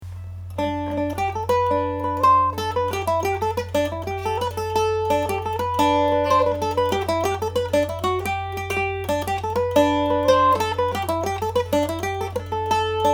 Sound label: merrily kissed the quaker, Irish Mandolin